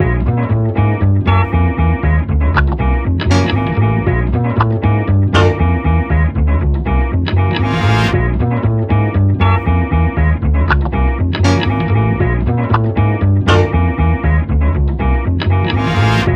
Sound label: Kidsroom; Frogger in Toy Town